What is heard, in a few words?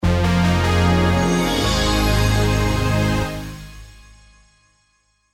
jingle